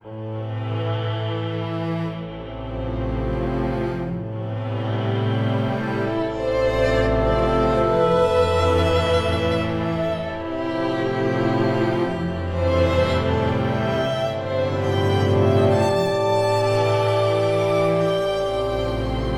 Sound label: heart wrenching suspense; Jamie Coleman, Film Composer